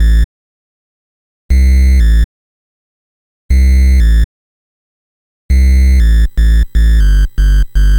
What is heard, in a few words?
NES Bass, chiptune